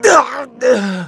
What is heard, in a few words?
death; mohaa; dialogue